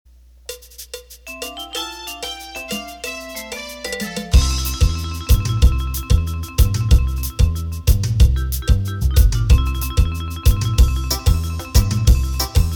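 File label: Beguine Fast, Roland accordion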